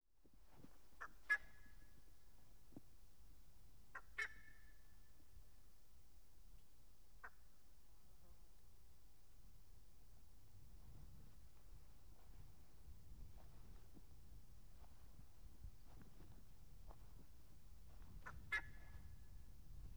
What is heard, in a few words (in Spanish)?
Paisaje sonoro canto del pajaro Peyar (En Sekbuy); ascenso a Seckbuy montaña sagrada